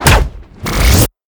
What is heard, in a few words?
Bullet Extraction; Plastic Surgery